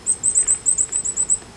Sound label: Chickadee Tsleet